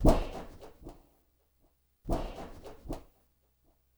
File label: wobble; Spectrasonics; Liquid Grooves 1 & 2; ODDS + ENDS